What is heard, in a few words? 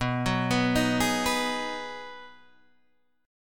B 6th B down Guitar Open D